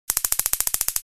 Taser buzz electrical clicking stun gun

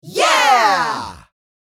Crowd reaction yeah

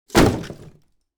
Designed cinematic wood impacts simple clean hits

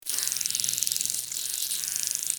Insect little monster flying wings

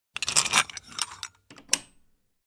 Bicycle cable lock locking

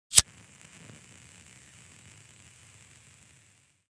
Bic lighter flicking lighting up and fire burning

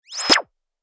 TV switched on television turn on; sitcom

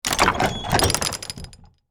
Wooden chest box treasure opening

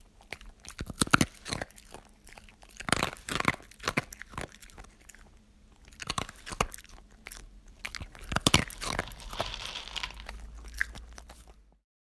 Dog eating kibble

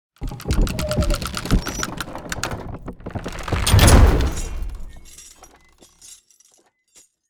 Catapult charging and shooting medieval

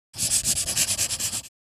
Pencil scribbling on paper

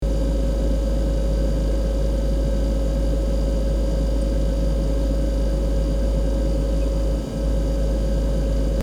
Small kitchen refrigerator hum loop

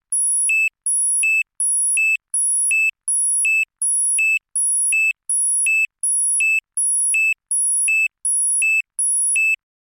Warning alarm beeps

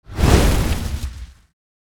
Fire magic spell short attack; fantasy

